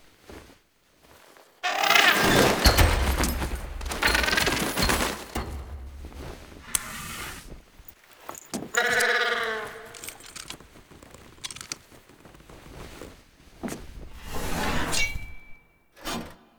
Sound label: Bird Hug Foley; Entrati Labs; Cavia Hug Cinematics